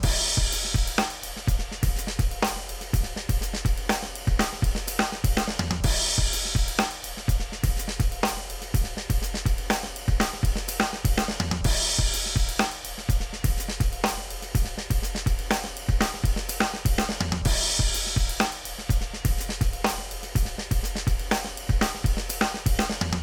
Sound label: Busy 3/4 124 bpm, drums loops; BASEMENT DRUMS VOL.1